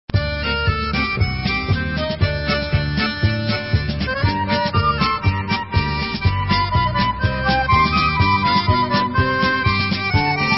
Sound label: Nigun Instrumental